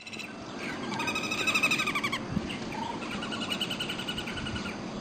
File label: Common Flameback angry call; Amar